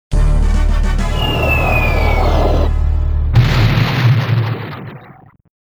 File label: Cannonball; Medieval Madness; pinball music